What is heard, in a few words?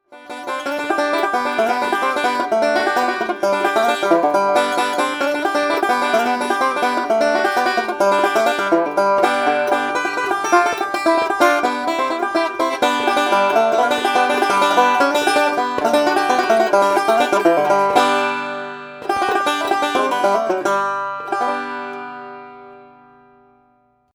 banjo